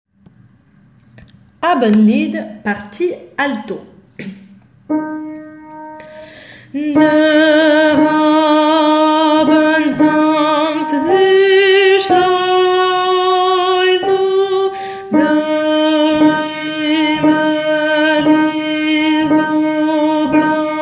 Alto; Abendlied

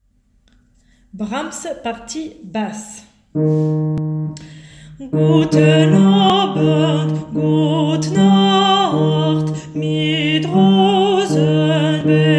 partie basse

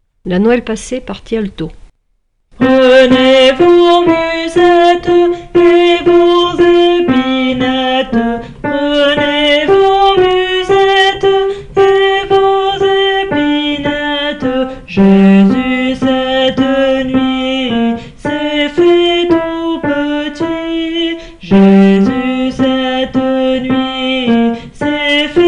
La Noel Passee Alto

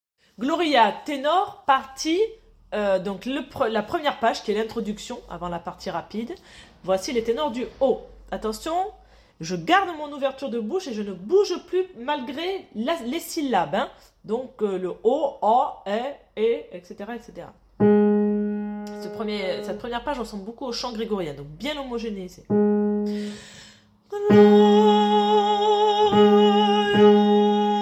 tenor haut; Gloria 1 ere page

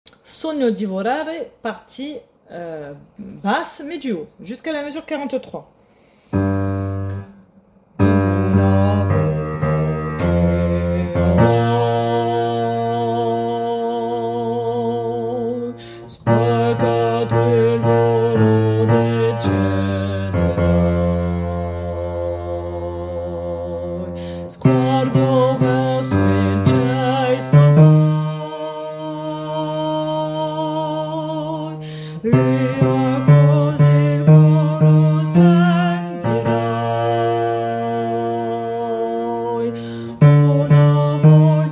Basse du haut

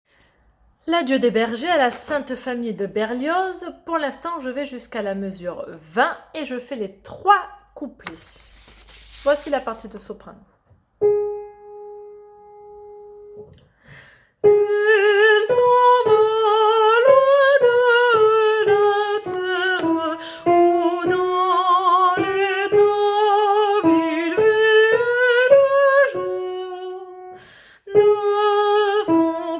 Voix soprano

